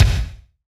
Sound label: BD, BASS DRUMS; EdgeSounds